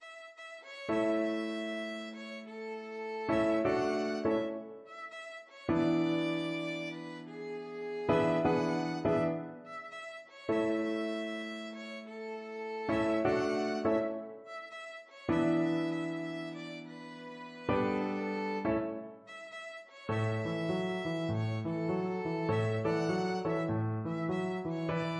whole world violin

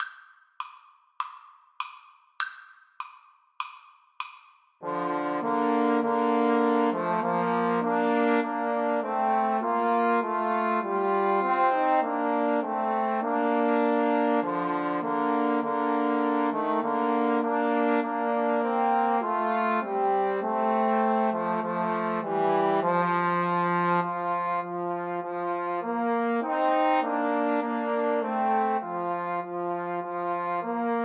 trombone trio

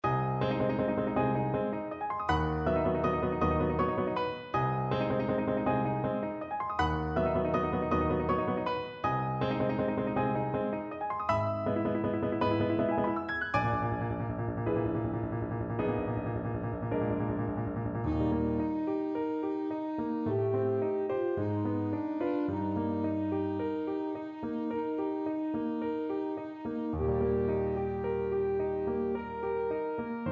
ASAX, saxophone

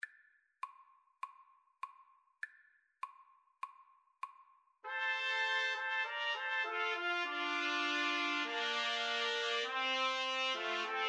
2 Trumpets Trombone

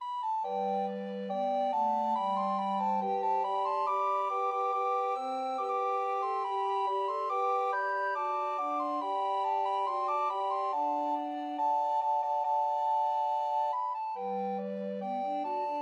rondo recorders, recorder quartet

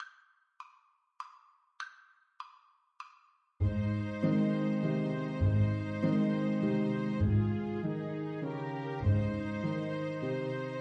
Clarinet

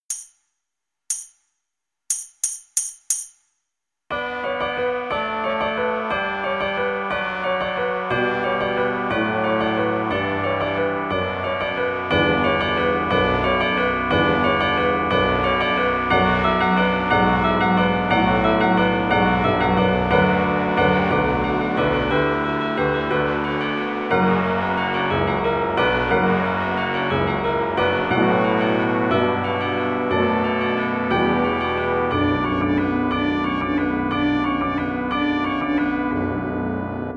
viola

